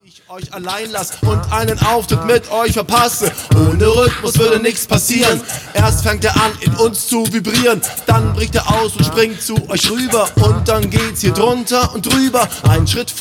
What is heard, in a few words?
Beat